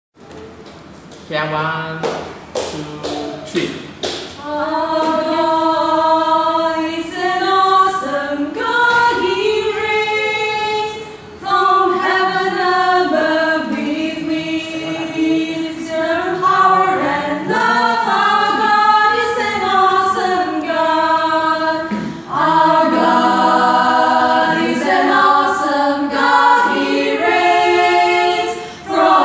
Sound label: tenor